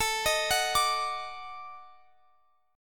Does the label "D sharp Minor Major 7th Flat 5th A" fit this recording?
Yes